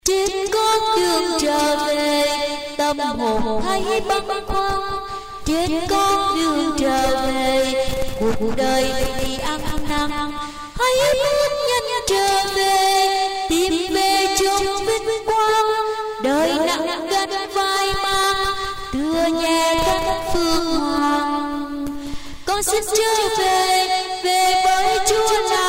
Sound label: basso tenor